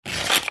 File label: technology studio controller scrub dial turn short; zapsplat